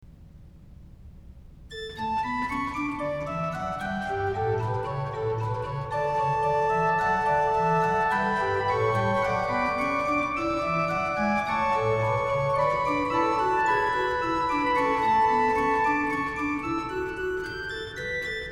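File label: Ps81, organ; Rabstejn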